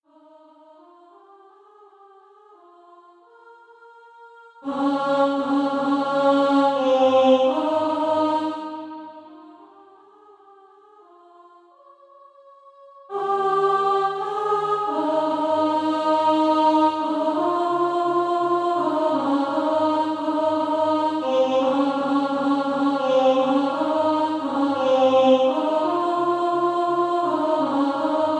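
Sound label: alto, Practise Music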